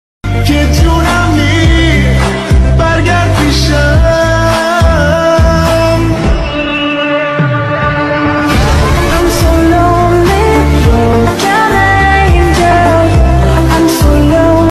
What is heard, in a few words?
new english song ringtone